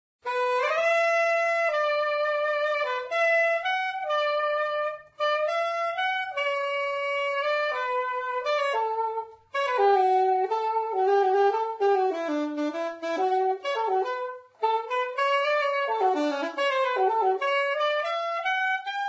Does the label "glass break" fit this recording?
No